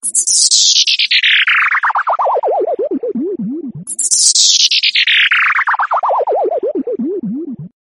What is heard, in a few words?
android ufo ringtone